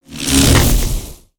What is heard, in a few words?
Spinjitsu Lightning One shot